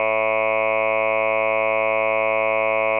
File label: 7 formants